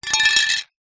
impacts glass small pane sheet thin drop on ground